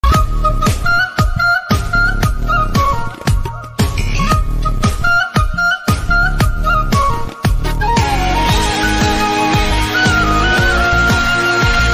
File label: tamil song flute music; tamil ringtone